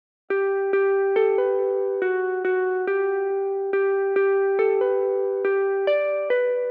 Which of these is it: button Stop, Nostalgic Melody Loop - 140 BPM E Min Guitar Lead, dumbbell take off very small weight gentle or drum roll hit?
Nostalgic Melody Loop - 140 BPM E Min Guitar Lead